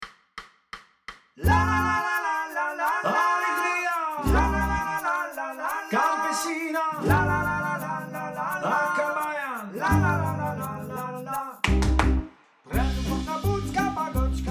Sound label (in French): Troix voix (et demi), chorale